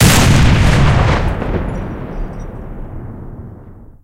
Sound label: cannon 100mm d10t shot, tanks; cannons; War Thunder